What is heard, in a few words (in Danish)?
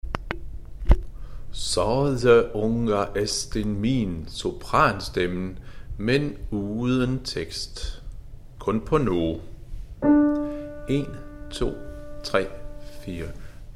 sopran; Sov min lille (islandsk)